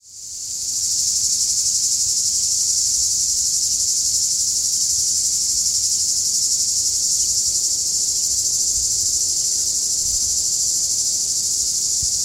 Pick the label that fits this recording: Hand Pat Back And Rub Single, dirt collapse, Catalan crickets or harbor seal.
Catalan crickets